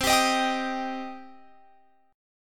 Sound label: C Minor C down Guitar Open F; Cm strummed